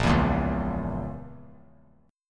Bb Diminished Bb, Chord; strummed; JGuitar

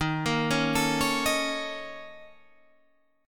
Bb Minor Major 7th Double Flat 5th

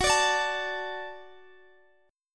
Gb Minor Sharp 5th Gb Guitar DADGAD

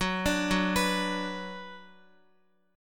Gb Suspended 4th Sharp 5th Gb, down, Guitar; arpeggiated; JGuitar

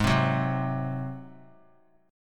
Ab 7th Ab down; Ab7 strummed; JGuitar

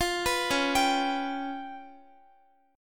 C sharp 7th Flat 5th Guitar, chord; C#7b5; arpeggiated; tuning=4E,4A,5D,5G,5C,6F

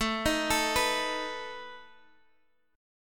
A Suspended 2nd Flat 5th, Guitar, Standard; Asus2b5; arpeggiated; x,12,13,14,12,x; JGuitar

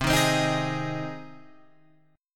C Minor Sharp 5th, Guitar, Open F, Cm#5 chord; strummed; JGuitar

